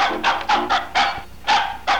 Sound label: bandit bark